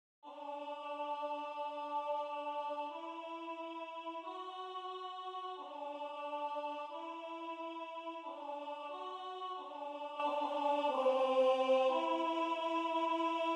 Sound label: Alto